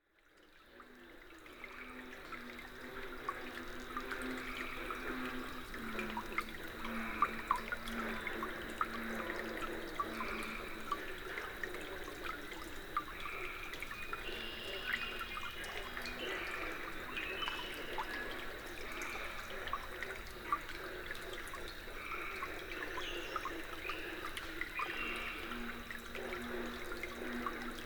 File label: Sycamore Branch Birds and Brooks